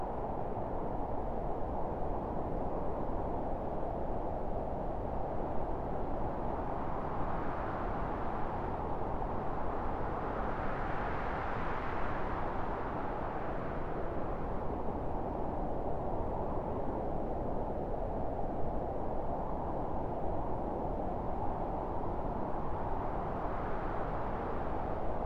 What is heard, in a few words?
wind